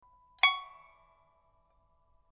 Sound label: pipa; chuYinKouXian